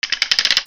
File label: SCIFI